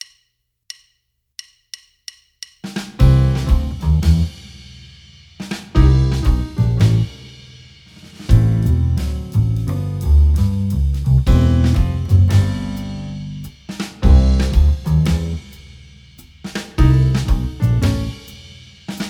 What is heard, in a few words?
jazz trio